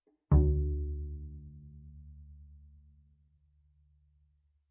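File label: Bass pizzicato fortissimo sul A D2, Double Bass; MIS Pitches - 2014; Strings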